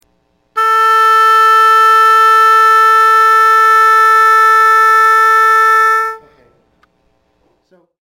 oboe

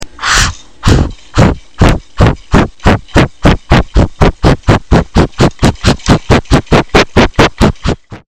gorilla

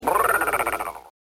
tigg rrrow

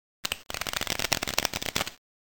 cards

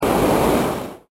Blow Torch

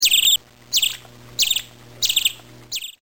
Birds

